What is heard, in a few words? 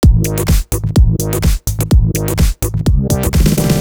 Dance Music